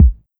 Kick; Electric Empire; pitchglitch samples

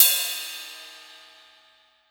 Ride; pitchglitch samples

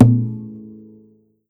Guitar Hit; Afleetingspeck; pitchglitch

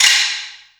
Perc Wood

